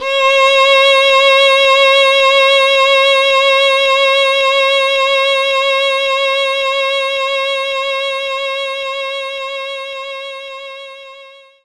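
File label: Violin C sharp 5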